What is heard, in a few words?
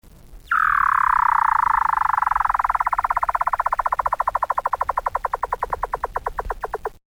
weddell ringtone, weddell seal